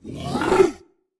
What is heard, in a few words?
Cry; Yowlar